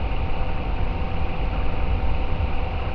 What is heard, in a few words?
platform hover loop